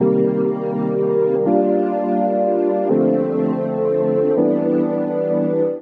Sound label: Cavity Soup C Major 165 bpm, Pads; MIDI Clips; Tonal; Skitter and Step; Ableton Factory Packs